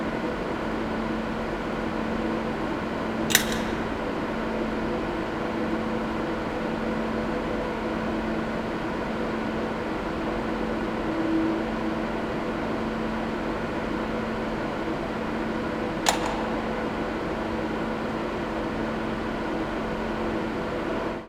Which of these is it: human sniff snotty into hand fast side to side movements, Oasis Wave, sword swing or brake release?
brake release